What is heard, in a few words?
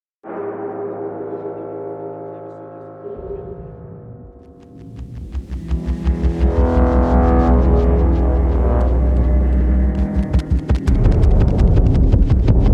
To Sleep (Instrumental)